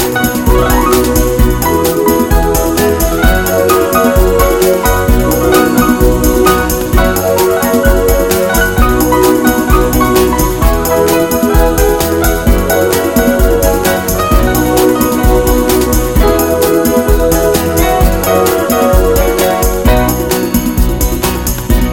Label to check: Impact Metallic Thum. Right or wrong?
Wrong